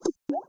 Sci Fi Throw And Pop

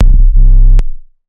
Bass Rugged Sub

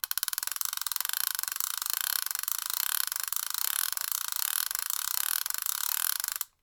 Toy Ratchet Spin Fast

